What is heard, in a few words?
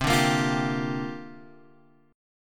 C 7th Suspended 2nd Suspended 4th C down Guitar Open F; C7sus2sus4 strummed